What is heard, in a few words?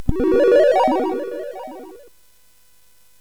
SFX; Narco Police; hvsc